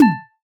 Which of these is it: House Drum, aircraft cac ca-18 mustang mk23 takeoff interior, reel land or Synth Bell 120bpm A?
reel land